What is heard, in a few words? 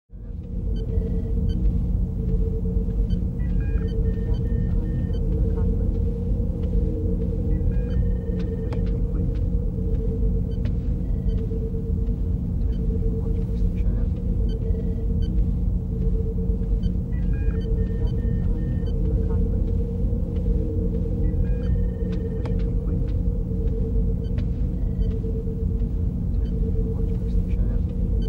Bridge Background Ambience; Captain's Logs